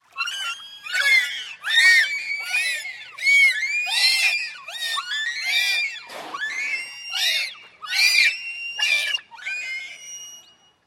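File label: howler monkey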